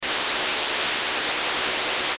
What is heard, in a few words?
static light; Static Light Flange; Production Element Imaging Element Accent Transition; Blastwave; Static Sound Effects